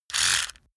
servo rattle on off short

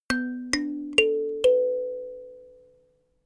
notification kalimba sequence ascend

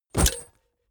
leather metal bodydrop

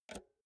insert jack multi socket quick

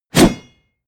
blade whoosh medium

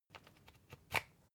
usb port metallic out soft

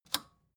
record player power release off

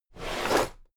drawer cabinet equipment open heavy halfway

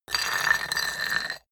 stone tile scrape high

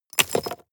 drop stones clack

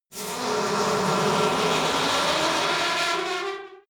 elevator old squeak move start short

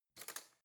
cutlery spoon drawer grab

